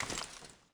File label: foley walk